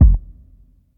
00s fluffy kick sound f key; kick drum samples

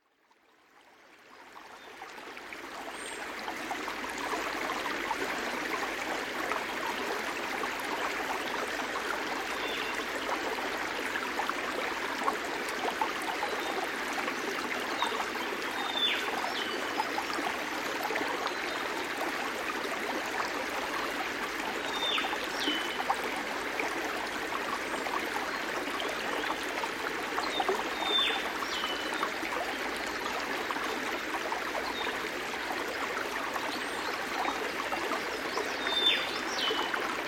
small river and olive whistler birds

